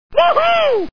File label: "woohoo"